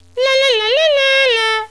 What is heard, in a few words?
wrong